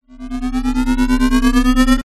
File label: sound design frequency modulation slightly lofi rising tone whine